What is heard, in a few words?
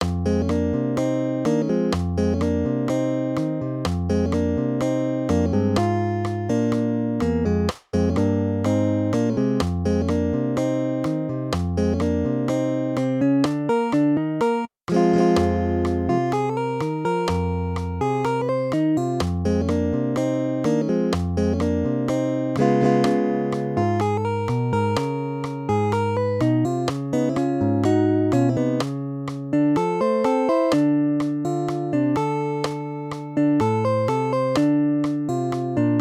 duet; 4/4